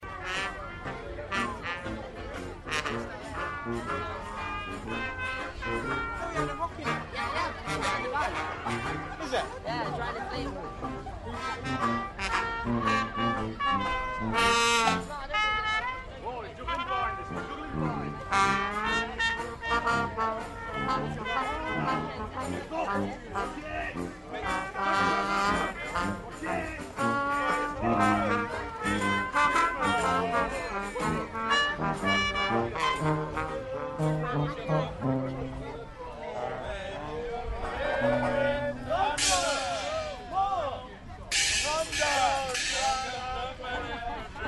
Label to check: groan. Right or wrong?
Wrong